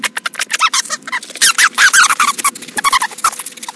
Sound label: voice, antlion grub; hl2